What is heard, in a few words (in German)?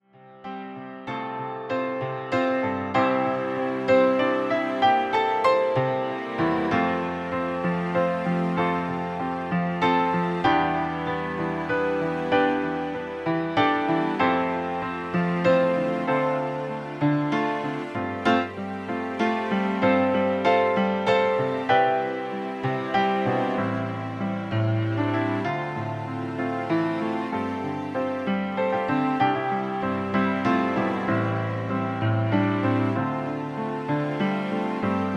vollinstrumentiertes Playback C-dur